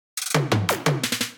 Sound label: Fill